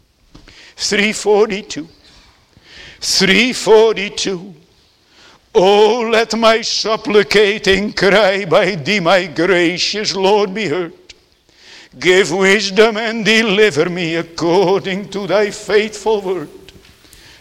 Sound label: Singing